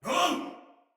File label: Surprised Soldier Male; Sinecure Soldier Male B